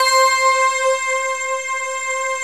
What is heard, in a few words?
D50 Stak, Roland